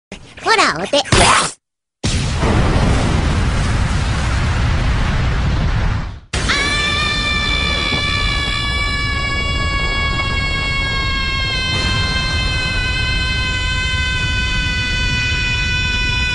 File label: Yell; Nichijou; Yuuko